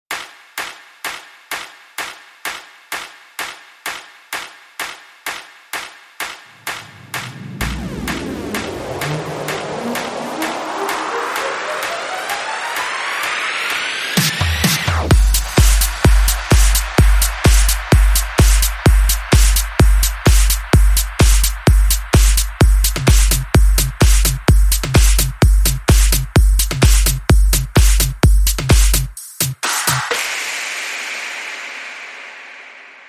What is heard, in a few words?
Beat